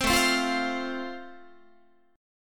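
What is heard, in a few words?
A Minor Sharp 5th, Guitar, chord strum; Am#5 strummed; 15,17,15,15,16,16; JGuitar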